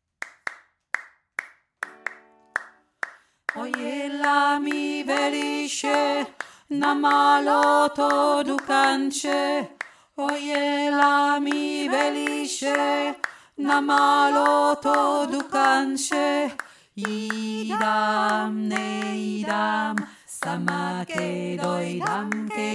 oy ela mi veliche tiefe stimme

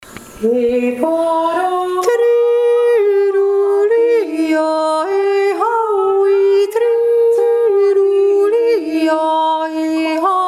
maori jodler

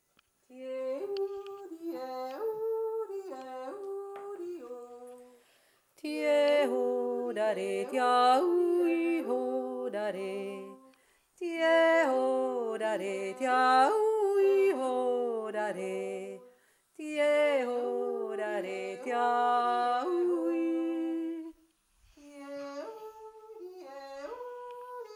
f gner verkehrter 2stimmig

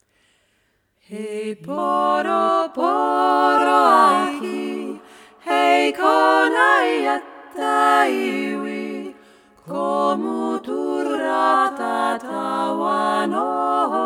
maorilied jodler

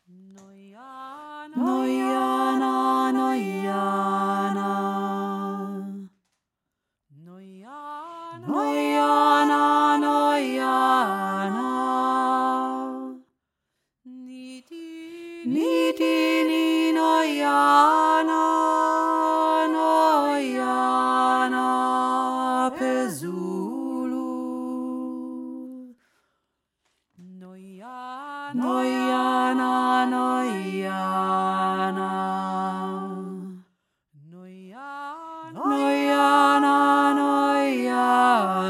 tiefe Stimme

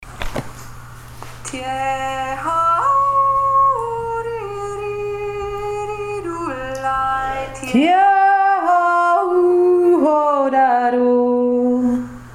wolfsjodler 2 stimme

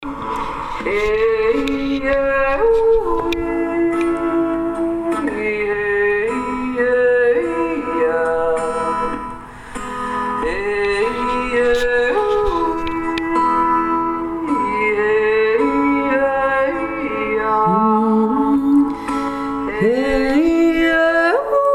eissch tzenjodler

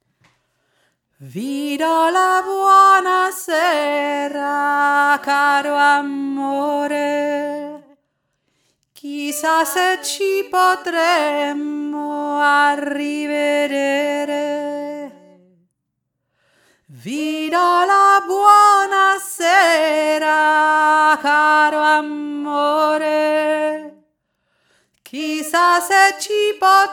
hauptstimme; vi do la buona sera

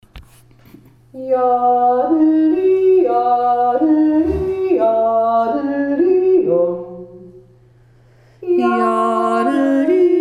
jodl beide Stimmen

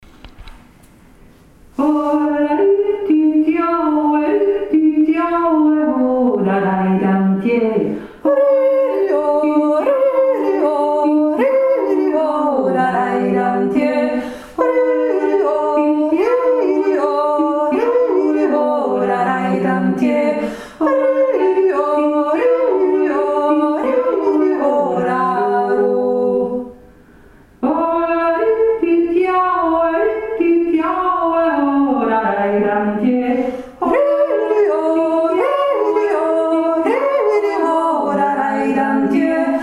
hollariti zweistimmig

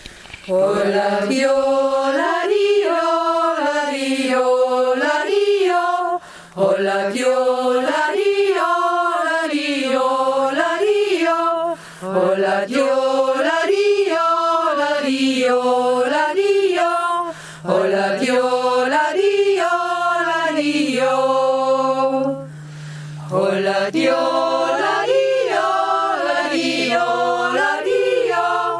s dtiroler jodler; Überbachjodler

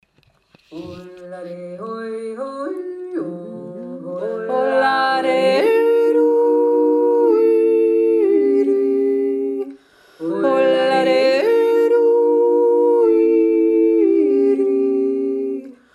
grundlseer hohe stimme, jodler

